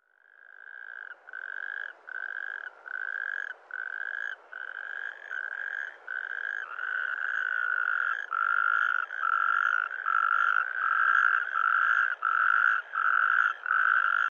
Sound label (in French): crapaud calamite